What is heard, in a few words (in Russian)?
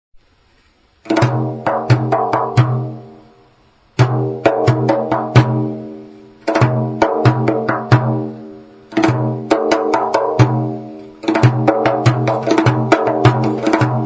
zvuchanie bubna sufijskogo otryvok